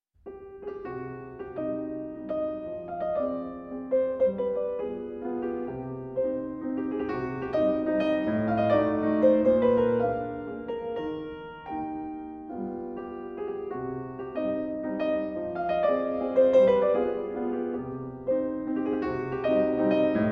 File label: Mazurka C minor; M. I. Glinka; do-minor